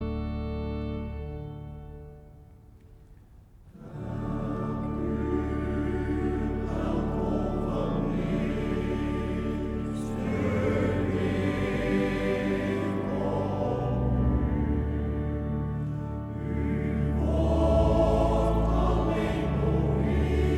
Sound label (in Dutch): koor